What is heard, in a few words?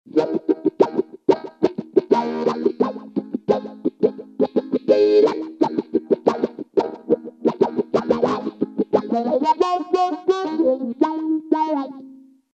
Autowah; DigitechRP; RP200